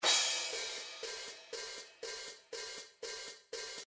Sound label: rock cymbals 2 bar